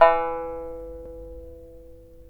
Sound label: SAN XUAN E4; Spectrasonics; Heart of Asia